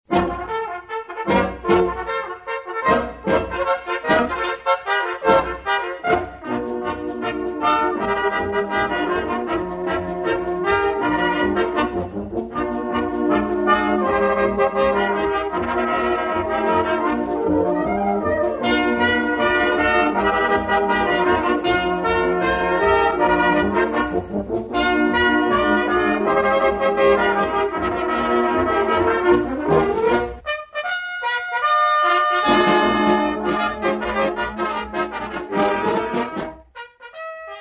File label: bugler; un solo de pistons